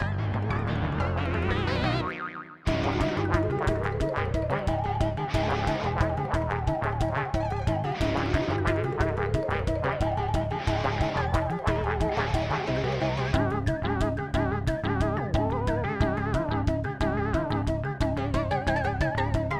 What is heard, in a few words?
Low Health; ROYal Rumble